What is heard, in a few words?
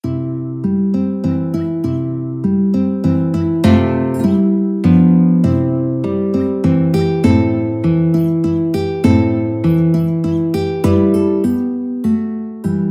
Waltz, guitar